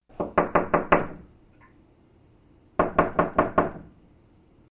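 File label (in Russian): stuk v dver sms, knock on the door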